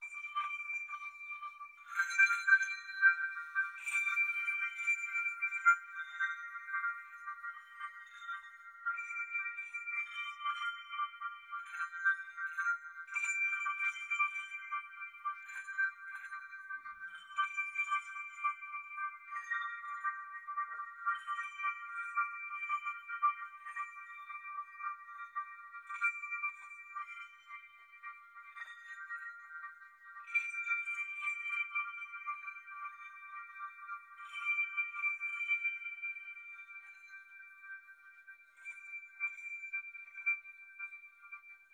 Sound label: Water Chimes; Raven; Dripping Water